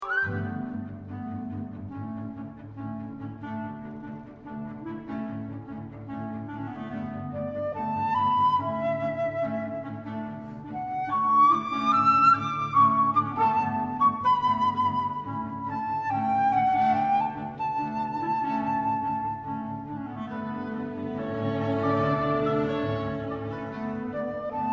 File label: flute conc